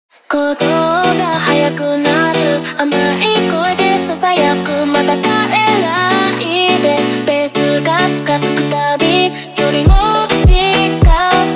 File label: Montagem ultra Slowed